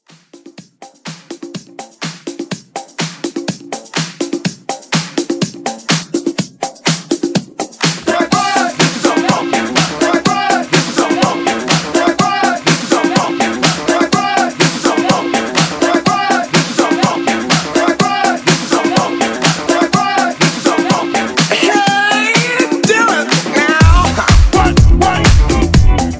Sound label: 70s, 124